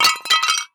pottery impact, glass; physics

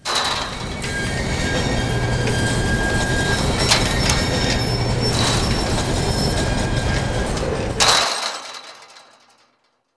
chain link open; ambient; Half Life 2; Episode 2; outland

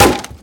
wood solid impact bullet

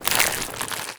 zombie move, foley; Half Life Model Pack

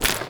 zombie move short, foley; creatures; Half Life Model Pack

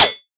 flavor metal, bullet impacts; Half Life Model Pack

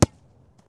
ball impact, rubber; physics

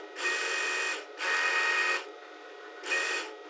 tech oneshot, office

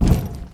metal vent, footsteps; player

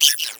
egg pain; player; Portal 2; eggbot

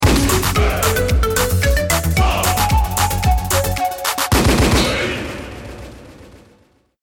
round mvp anthem

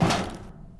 metal grate footsteps; player

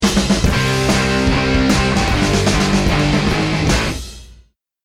lost round, music

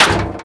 bullet metal grate; physics

